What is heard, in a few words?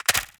Arm Weapon Rattle; Gunmech